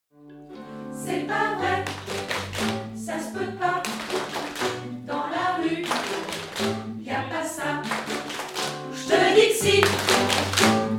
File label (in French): refrain rythme; Ce matin dans la rue